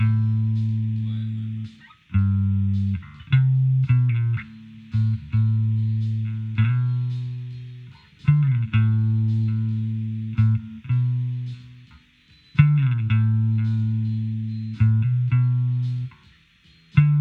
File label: Bass Guitar